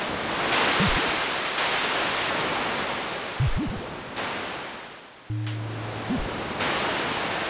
meadows

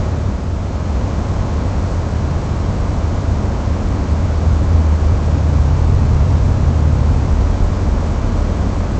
old siding, ambience

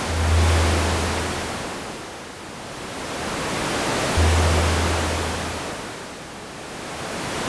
meadows

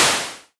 ash die

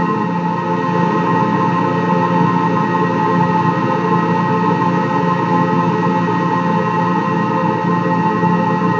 tower hum

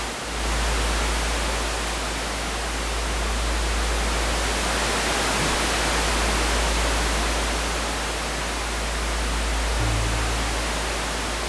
meadows night

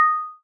mote pickup

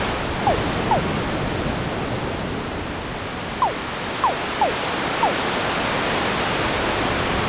ponds night, ambience

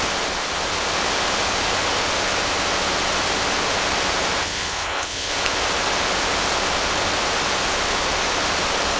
rust grove

